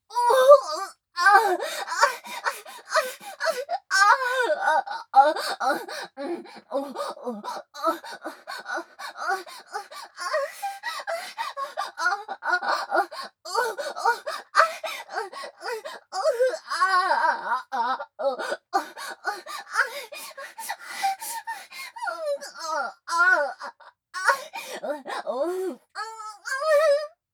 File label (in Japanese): 喘ぎ声 ふつう